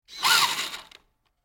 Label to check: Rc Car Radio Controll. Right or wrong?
Right